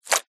horror gore strip of body flesh guts drop onto surface; zapsplat